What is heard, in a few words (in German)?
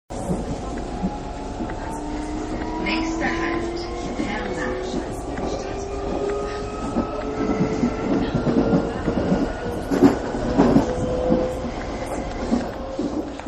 S Bahn Perlach